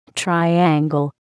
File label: triangle